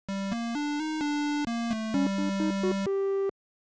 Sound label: Arcade game 16 bit or 8 bit mission failed game over; Game Over Sound Effects; the sound of losing a life